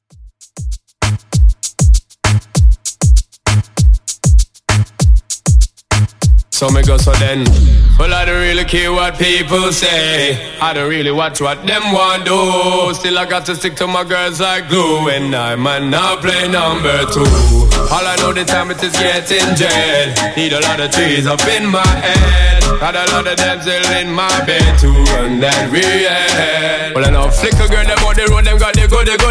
Epic Intro

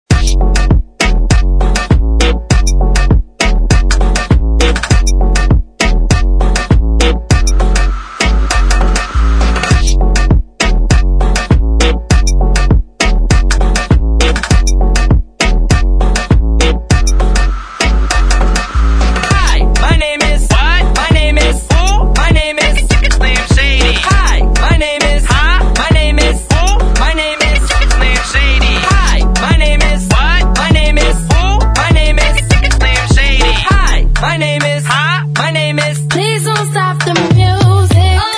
Hype intro Moombah

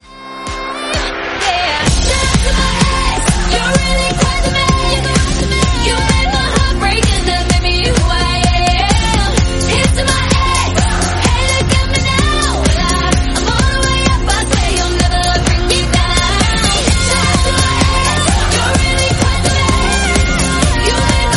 128bpm Fm

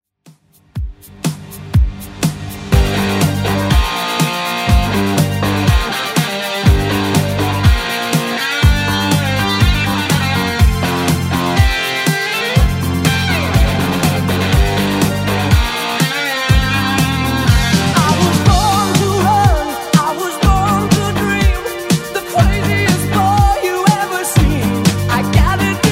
80s Rock 122bpm

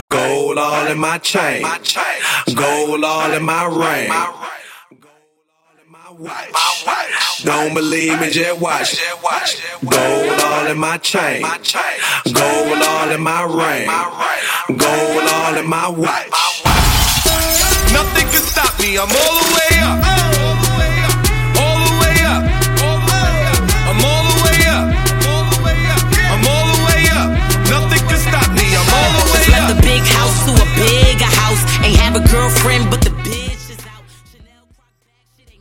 Bass House

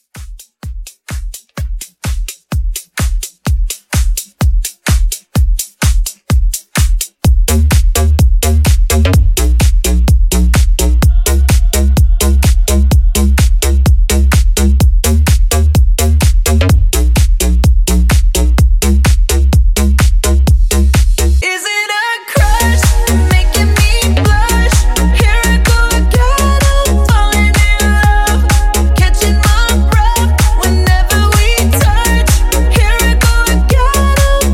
127 CLEAN; Club Edit

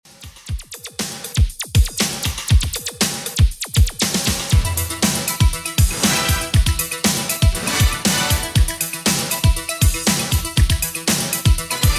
80s Redrum 119bpm

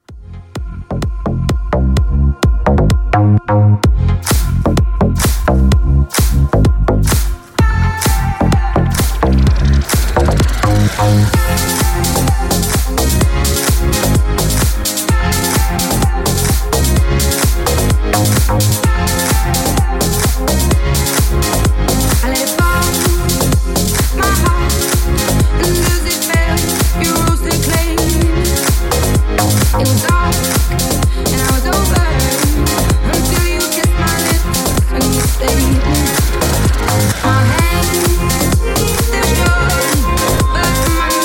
Epic House 128Bpm

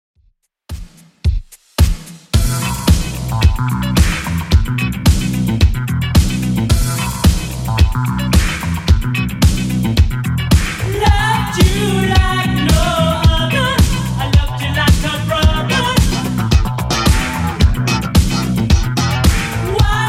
80s, 110bpm

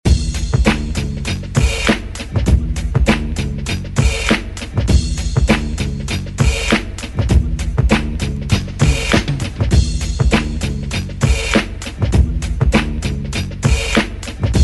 DJ Intro Outro Scratch 100 Bpm; DJDOLLS

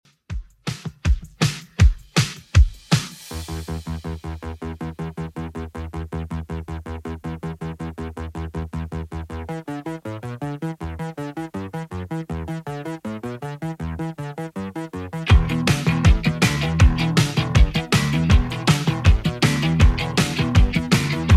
80s Redrum, 160 bpm

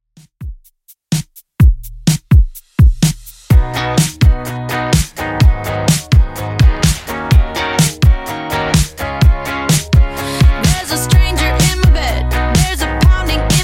Top40 Redrum 126bpm

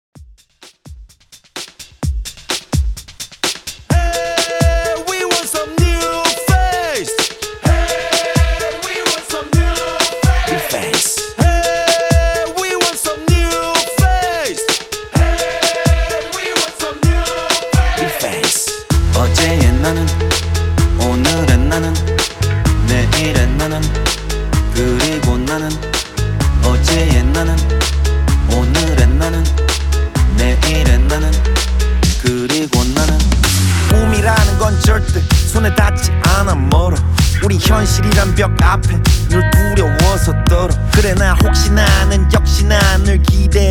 HYPE REDRUM, 128 BPM

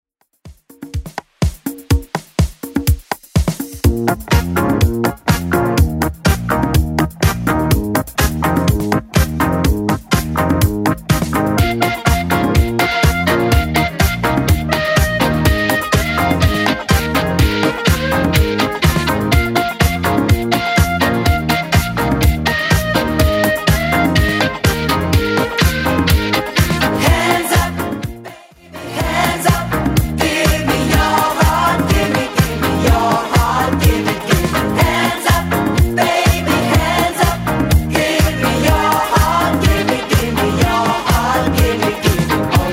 80s Club Edit 124bpm

